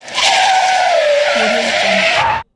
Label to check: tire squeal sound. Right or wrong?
Right